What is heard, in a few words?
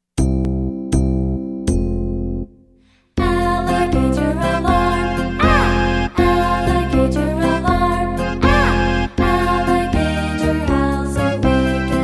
Alligator Alarm